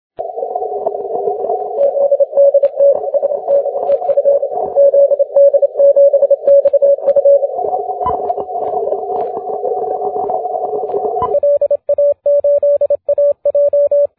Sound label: cw; 40m